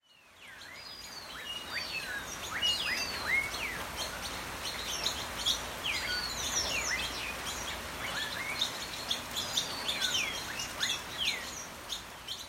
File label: rain song